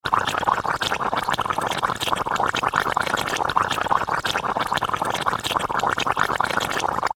inflatable lilo bubbles in water from puncture; sound effects one

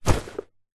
foley luggage rucksack backpack drop on grass

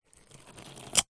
hospital syringe suck in thick gel like liquid

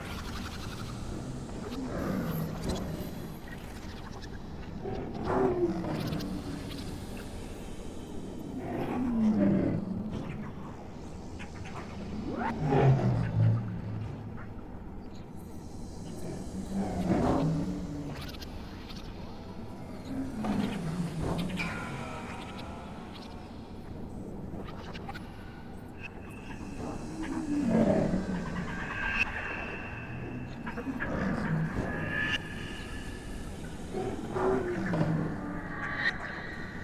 GHOSTS Atmosphere Maunders; Chindi

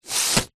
human sniff wet ill

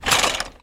sport clay pigeon thrower release clay

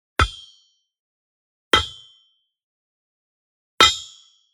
Impacts SIMPLE; soundbits

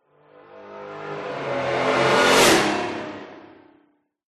vehicles sports car race drive past fast designed

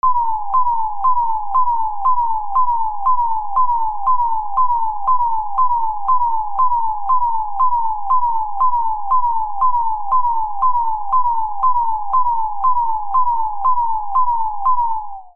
emergency alarm

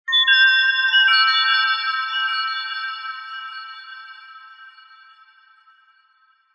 twinkle mysterious metallic chime

